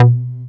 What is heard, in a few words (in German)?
Deep, baesse